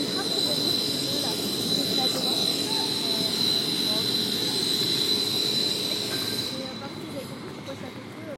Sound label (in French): Bruit Place